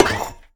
hurt, copper golem weathered; Minecraft

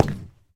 deactivate, shelf

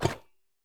become statue, copper statue

